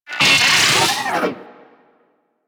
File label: Vocal Death; Warframe; Amalgam Moa